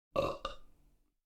Bei Tisch Tablett Mit Glaesern

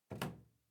Kiste

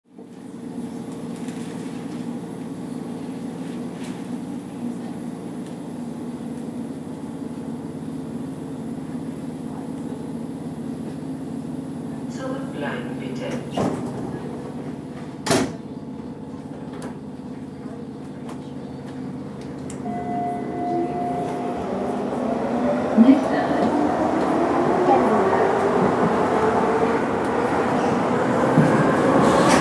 u bahn innen

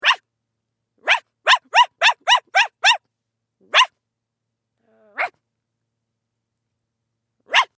bellen Mensch

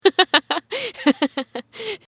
lachen weiblich

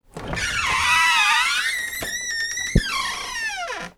quietschen

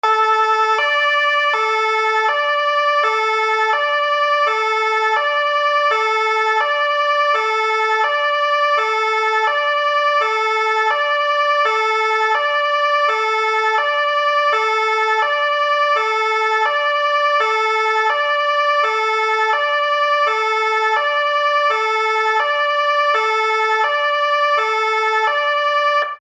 Martinshorn Einsatzwagen

